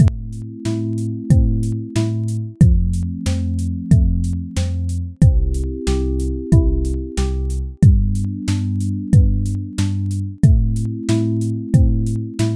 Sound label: bgm